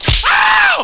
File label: thwip